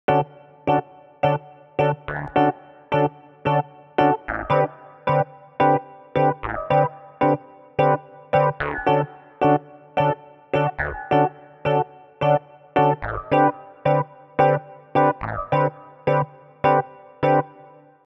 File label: Two chords; Two chords, a short sequence.